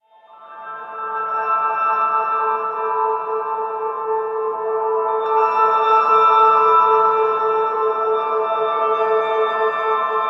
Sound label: Map Piano; No Man's Sky